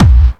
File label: Clubby Kicks, Bassdrums